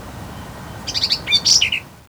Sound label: program error; peach